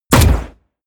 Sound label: rifle assault rifle fire; comedy-cartoon; Pistols and guns; pistolen-gewehre